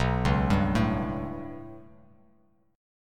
B 7th Suspended 2nd Sharp 5th down Bass Standard, chord; B7sus2#5 arpeggiated; JGuitar